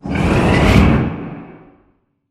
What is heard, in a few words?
sliding doors open slide; beacon; Titanfall 2